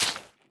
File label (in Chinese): 湿海绵 破坏声